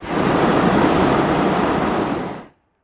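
Hot Air Bllon Burn Cl, Hot Air Balloon Burner, Close; Medium Hard On, Off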